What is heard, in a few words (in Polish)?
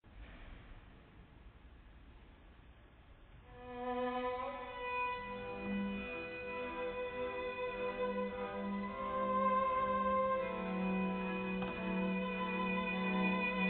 Preludium; F.Chopin